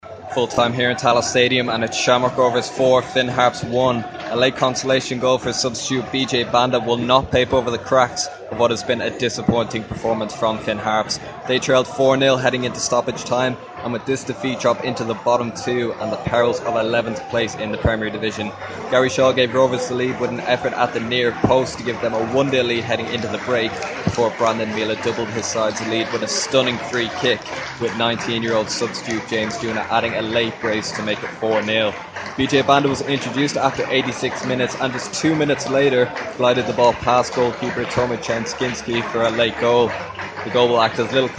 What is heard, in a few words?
harps